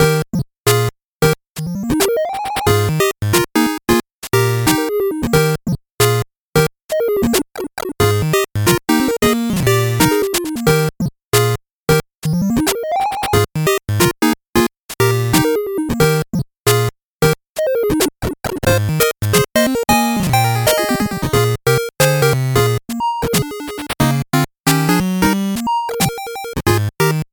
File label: 8bit bgm slow